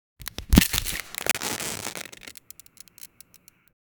crinkle of an envelope; everyday life

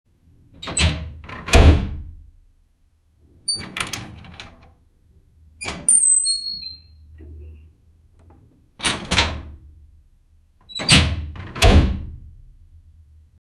open the old metal door

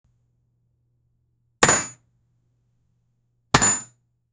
a gunshot; bullet

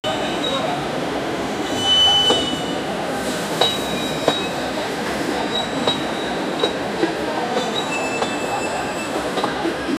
ambience of a busy Indian railway

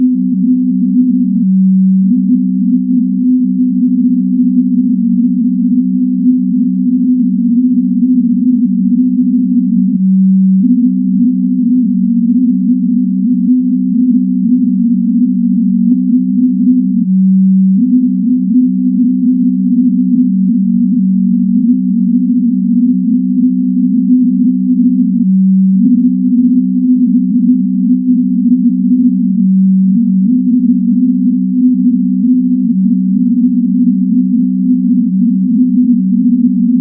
a calm retro mechanical, button

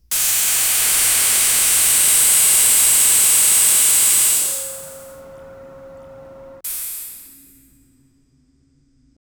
short industrial steam re, steam release

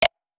muted mechanical click, button

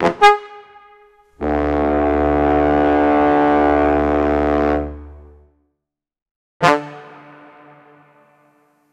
goofy triumphant horn, brass stabs

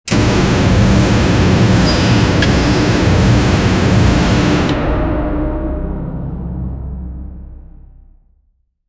sound of a massive indust, airlock

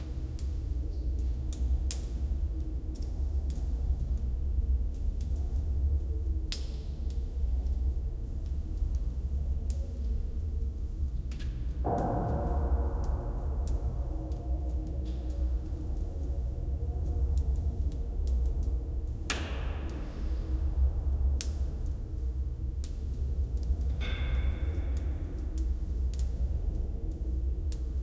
warm underground chamber

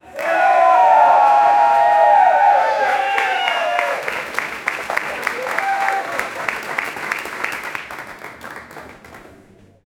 an audience of 5 people, concert

